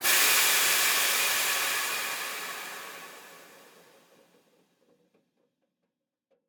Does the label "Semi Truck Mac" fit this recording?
Yes